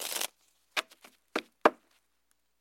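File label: Cards Close Deck And Tap on Table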